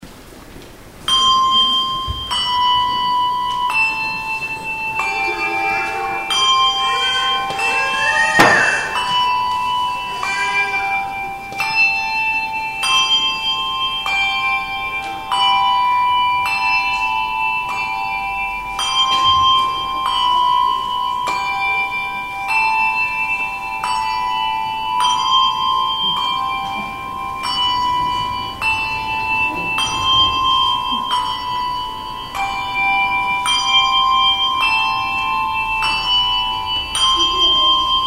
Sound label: Change Ring Demo